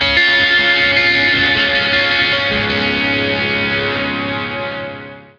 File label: Lake Hi Gtr D flat